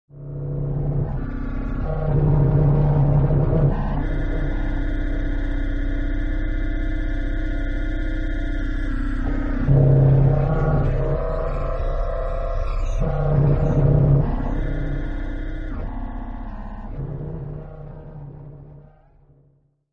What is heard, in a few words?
Matrix Jitter, thriller-horror ambience sound; Scary Soundscape Sound Effects